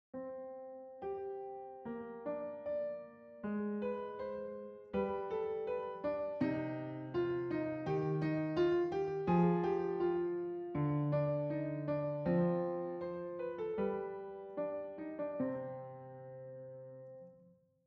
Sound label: Piano